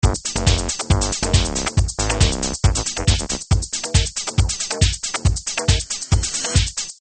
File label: Groove